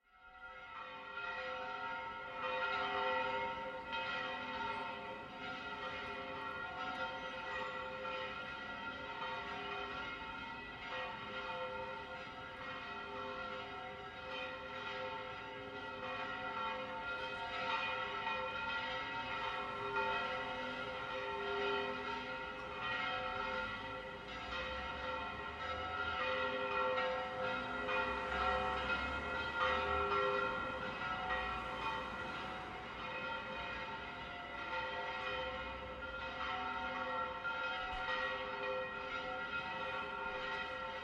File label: Church Bells, Ondara Alicante Spain, 3d binaural